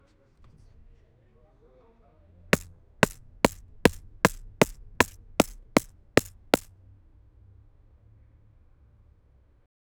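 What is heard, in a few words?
hitting ball from bat, baseball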